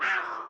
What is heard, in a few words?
Cat Attack; unsighted